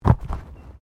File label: Body movement Fall Heavy